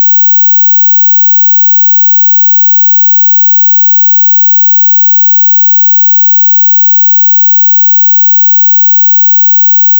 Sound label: 22kHz